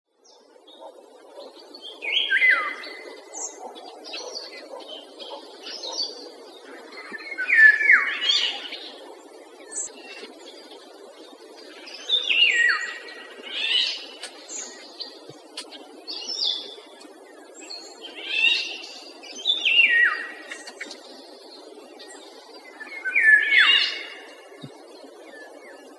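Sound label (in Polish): wilgi, śpiew prawdziwej wilgi. Na tym nagraniu słychać też skrzeczący głos, który również należy do wilgi; wroblowe; zapozyczenia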